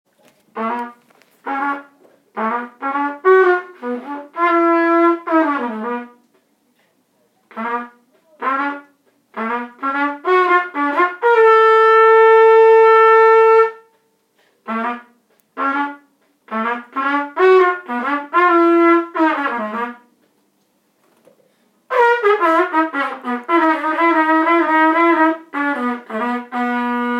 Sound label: trumpet solo